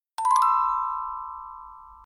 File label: new message